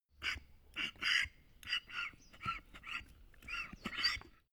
donkey grunt snort

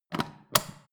foot switch turn on off depress full

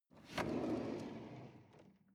drawer medium open draw

